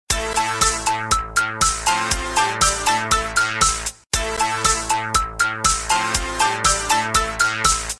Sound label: android ringtone